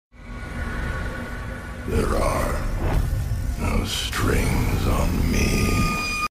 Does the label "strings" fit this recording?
Yes